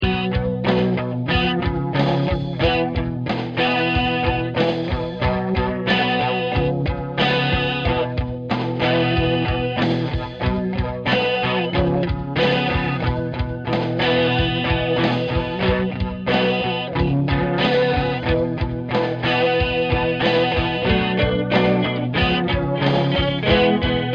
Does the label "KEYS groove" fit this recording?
Yes